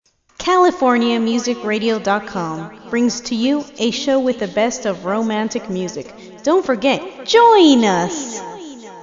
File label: Ramantic Music ingles